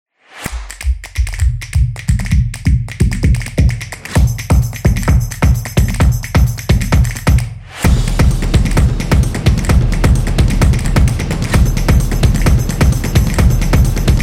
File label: Motion Graphics Drums; percussive nation vol 4